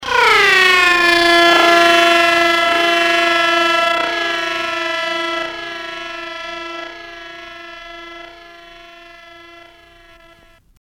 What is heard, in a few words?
horn timestretch